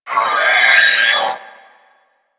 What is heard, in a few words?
Sentient Brachiolyst Death; Enemies; Barks